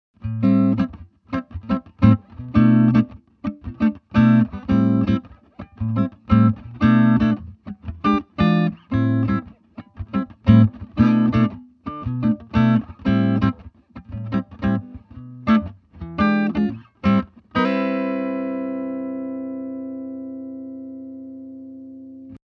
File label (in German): vox mv50 clean hals